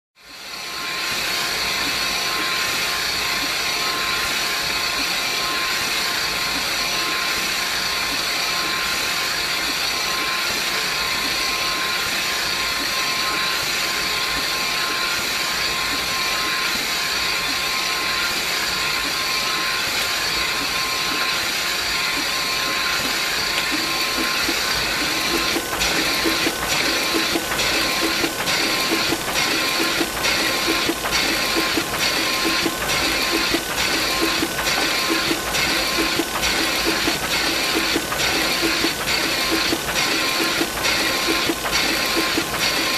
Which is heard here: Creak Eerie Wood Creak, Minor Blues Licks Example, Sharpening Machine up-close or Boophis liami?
Sharpening Machine up-close